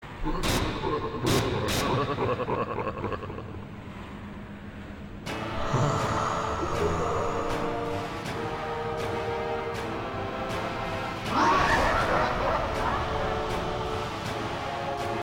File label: Laugh; FFXII Zalera Intro